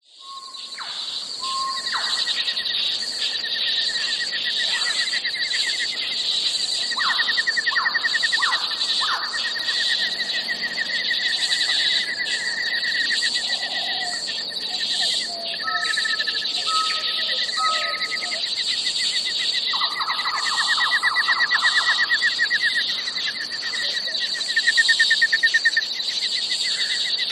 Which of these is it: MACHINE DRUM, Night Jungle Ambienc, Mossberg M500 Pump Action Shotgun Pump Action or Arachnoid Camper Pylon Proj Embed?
Night Jungle Ambienc